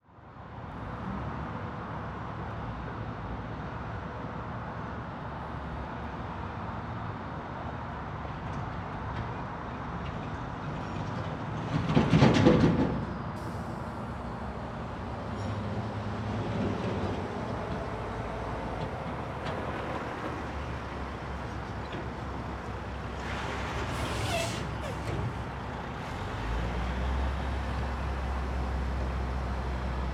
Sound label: Ambience Construction 180D; AdobeCC